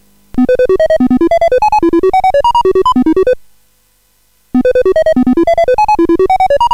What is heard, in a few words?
Music Game BASIC, sid CSG8580R5; hvsc